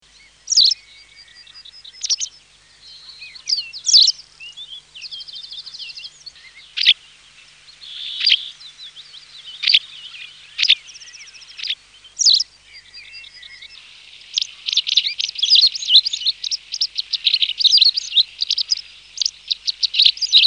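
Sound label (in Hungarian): Barázdabillegető; Madarak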